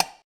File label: arrow impact metal; 0ad; attack